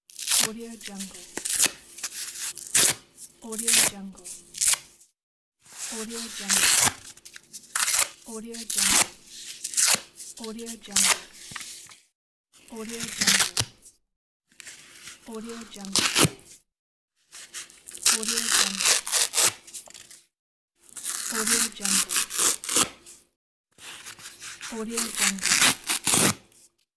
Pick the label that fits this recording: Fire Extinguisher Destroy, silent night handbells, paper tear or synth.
paper tear